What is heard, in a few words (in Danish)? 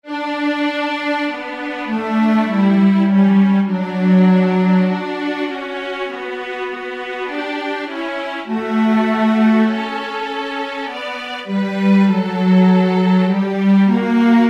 tenor; Dejlig er jorden